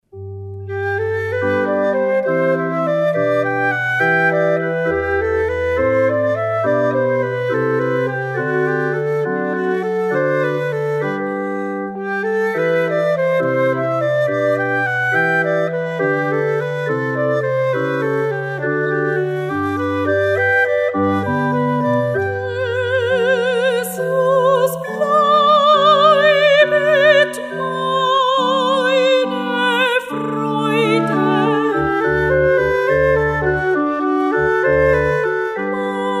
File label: Jesus bleibet, Cantata 147; repertorio para bodas